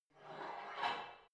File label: barbell heavy plate pull out quick reverby, fitnessstudio